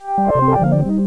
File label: negative lose; balatro